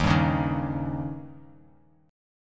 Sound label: D sharp 7th D sharp